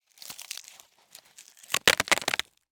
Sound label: Cardboard, honeycomb, crush, collapse, short, squeeze; Eiravaein Works; Vaeyan IV